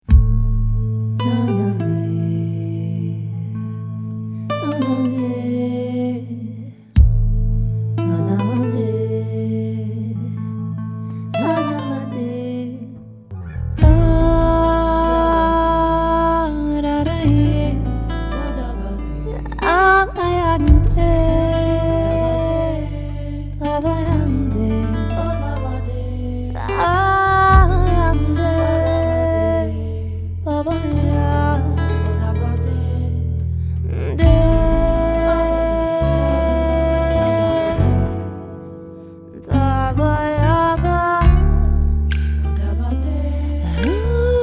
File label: Intro Deep breath